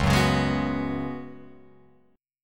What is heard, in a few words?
Db 7th Suspended 4th, Guitar, Open F, chord; JGuitar